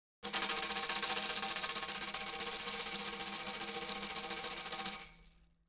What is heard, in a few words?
tambourine roll